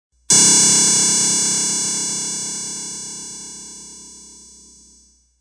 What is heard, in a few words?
Ringer; Rings